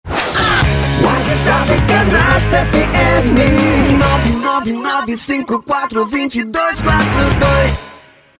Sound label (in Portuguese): vinheta